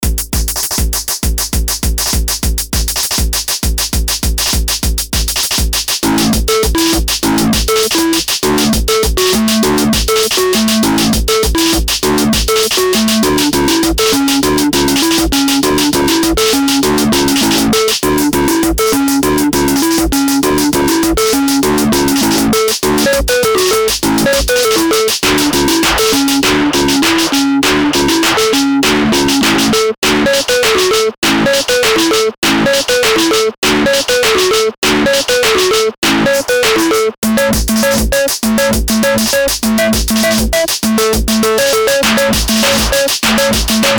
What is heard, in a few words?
Pacific Jazz